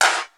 GATE CLAP; Crypton - DrumBase5000; GATE KITS

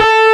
A-1 OVER D, GUITAR; AKAI S-Series CD-ROM Sound Library VOL-8

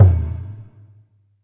Alesis DM5 Perc

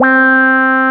QUAC, QUACK SOLO, Synth; InVision Interactive; Keith Emerson; The Most Dangerous Synth and Organ

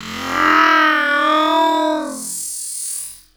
Female Stretch Vocals; Houseworx

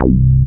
TB303; Best Service Gigapack 1; SYNTB303

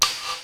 INDUSTRY HIT

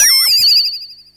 FX, Drum & Bass

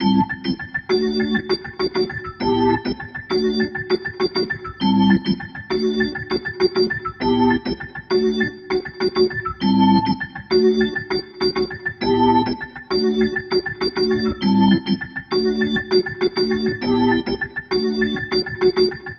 Rotor Riff, Organ

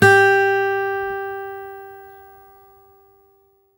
HRD PICK; Quantum Leap; Guitar & Bass

